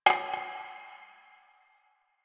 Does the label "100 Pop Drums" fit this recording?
No